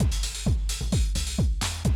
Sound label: 130, Classic DrumLoops